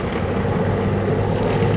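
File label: tank engine; PCGAMER Disc 2.8 SEPT 1996; SHELSHOK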